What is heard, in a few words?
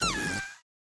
balloon blast